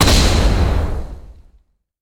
Explosion; boomerang fu

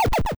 SFX Enemy Death; Castle of No Escape 2

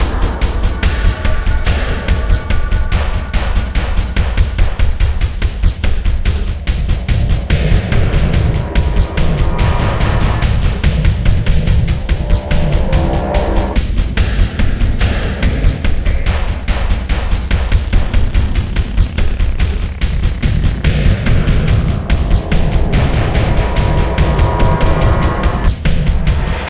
Boss Theme; Insanely Twisted Shadow Planet

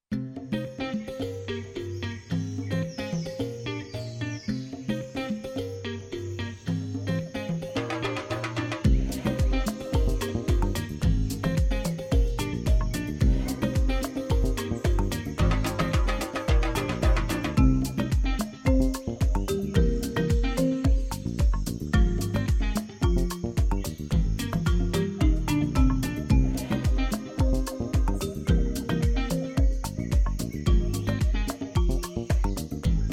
Jungle Lobby Theme; Kahoot

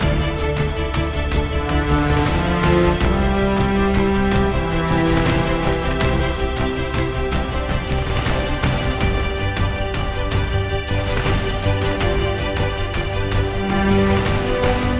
Mood High; Stormworks: Build and Rescue

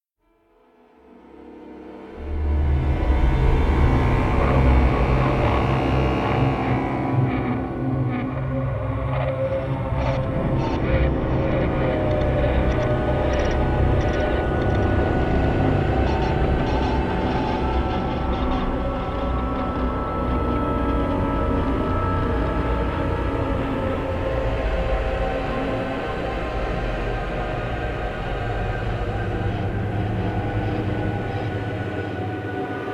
Music Action Scary No Rhythm; starcraft 2 wings of liberty; MissionMusic

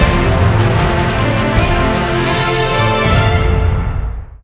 Sting Boss Win fight; percy jackson and the olympians the lightning thief 2010 nds gamerip

